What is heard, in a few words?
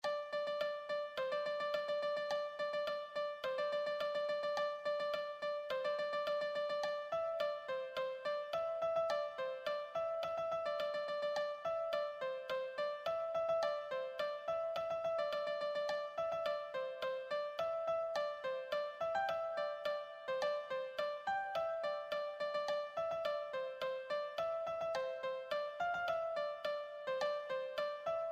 melody, piano